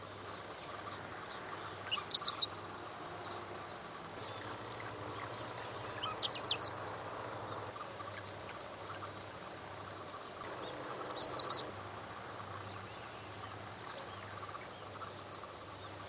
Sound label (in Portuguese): Chupim